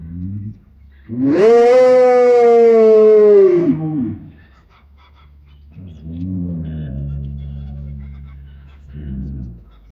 five prehistoric humans, savannah

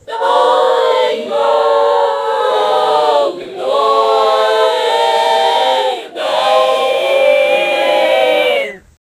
school

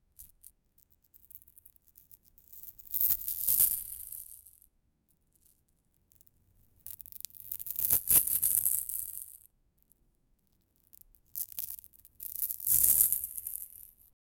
skeletons, creepy

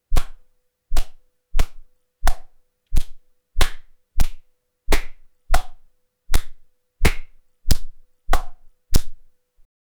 cartoony jab punch

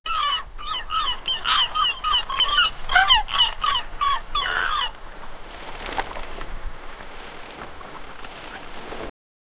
a crab crawls on stones

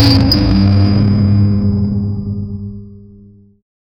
ritual energy collapse

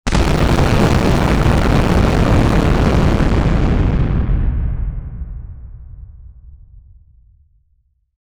the thunderous boom of a collapsing skyscraper; architecture

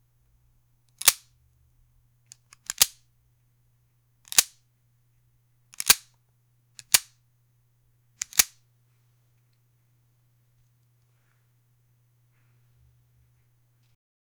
one guy is taking gun

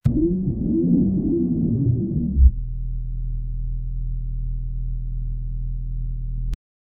futuristic charging up

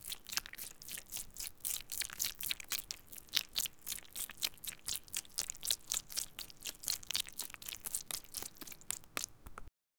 body

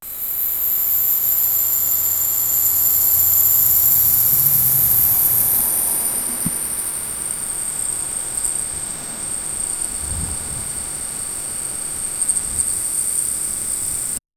realistic sound of arrows

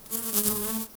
swarm of forest mosquitoe; insects

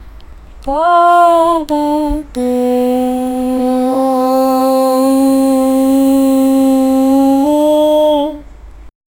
girn singing in unknown

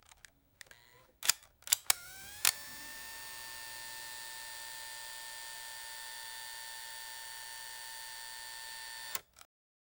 an old camera taking a; photography

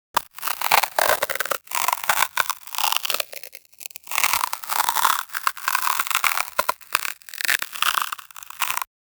synthesized hyper real

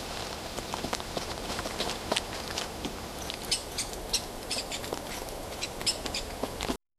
quick muffled footsteps, baby deer

